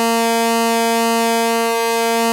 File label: 2600 A#3, ARP 2600 Synthesizer; Hollywood Edge - Giorgio Moroder Rare Synthesizer Collection